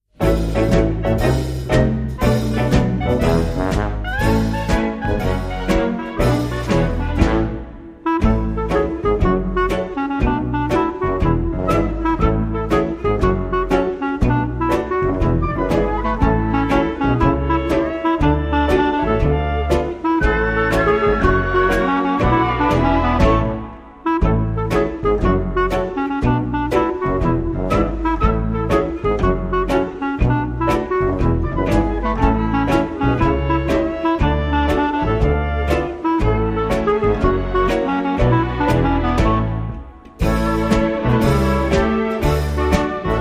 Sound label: Clarinet Theme